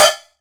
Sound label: Jomox Hat; techno drum fx; Analogue DrumHits